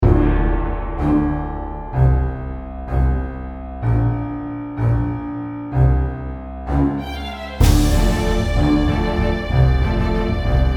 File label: Orchestral Version